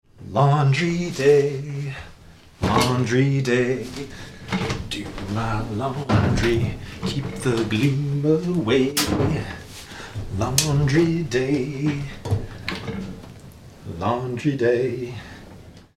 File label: laundry day acapella